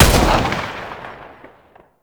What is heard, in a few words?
Lato Remaster Shot; Warframe; weapons